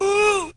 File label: "ooh"; Voice